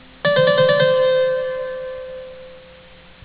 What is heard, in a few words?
Trillo (trill); ornament